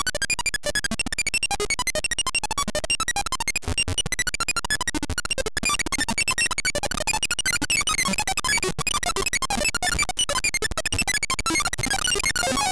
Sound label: Robot; iLinux